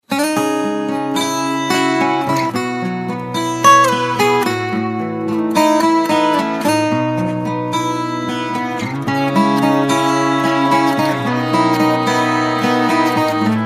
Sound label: acoustic